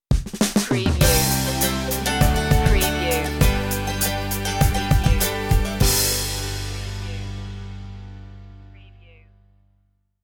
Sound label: family tree stinger